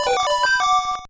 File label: Unknown Jingle; Sonic Advance 2